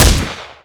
Chaingun Shoot

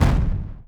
Flak Battery Hit

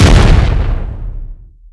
Large Cannon Hit

